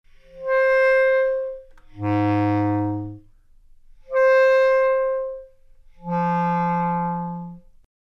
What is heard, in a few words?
Bass Clarinet, Single Sounds